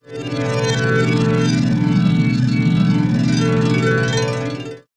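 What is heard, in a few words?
Harp, Chords Tones; Equipped Music; Loungin House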